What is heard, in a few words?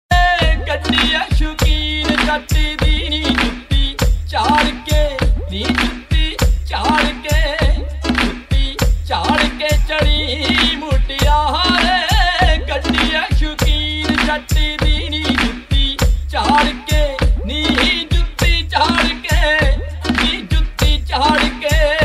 gurbani ringtone